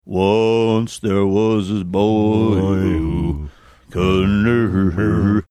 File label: mumble singing mmmmmmmmmmmm